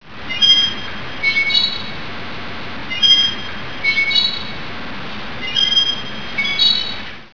squeaky pillar

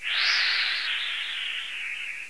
squall; ambience

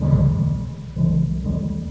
tribal, ambience

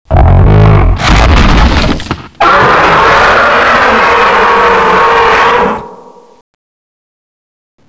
foot, ambience; renasaur

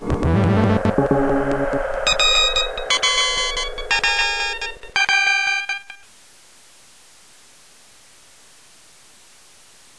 error

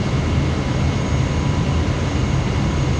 generator; polarcomplex

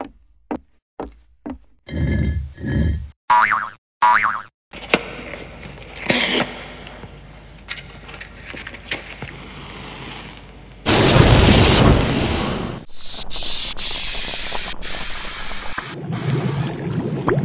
kitchen

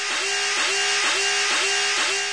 alarm

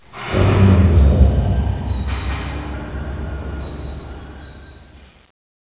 machinery unlock

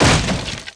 wood break

